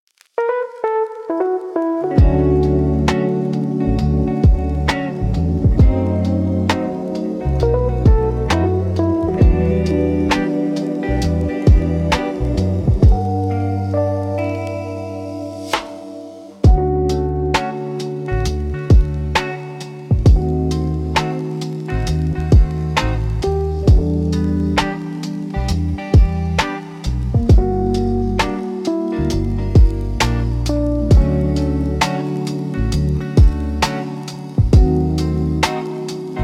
lofi cafe

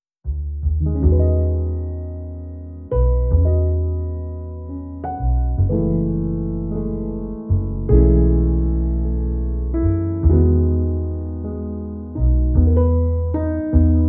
soothing calming relaxing jazz podcast music

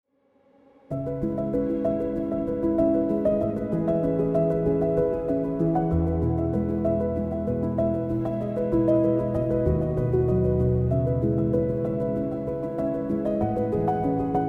inspiring piano music

